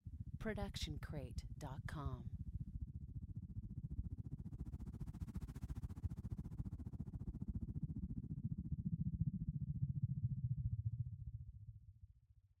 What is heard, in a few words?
helicopter pass by; soundscrate